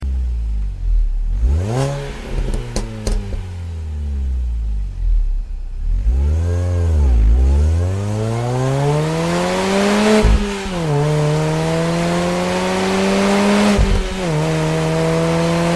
Mini Cooper S

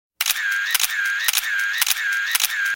Picture Taking

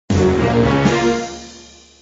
notification

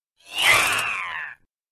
Alarmo o Bot

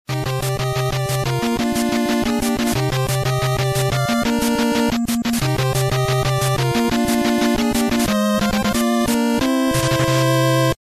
Game Over

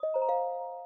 alert; BOTW

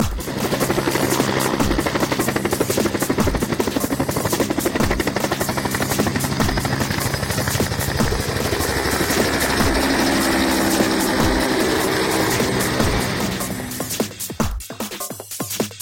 Helicopter Mix

